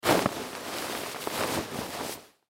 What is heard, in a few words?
Cloth Rustle Bed Sheet; Foley; Blastwave